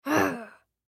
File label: Human Growl, Growl Male Six Yrs Boy; Pain; Voice; Blastwave; Humans